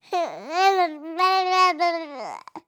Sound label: shivering, birthday; CosmicRage